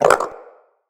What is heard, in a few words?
Wyrm Idle, Pets, Vocals